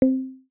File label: Beacon; Apple; iOS-16; VectorKit.axbundle